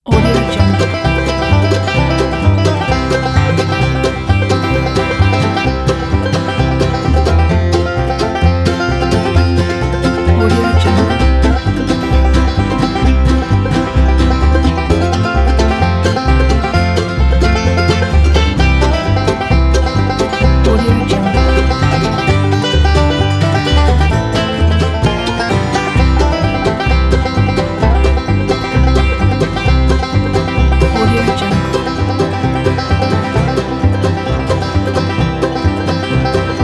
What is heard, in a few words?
fast bluegrass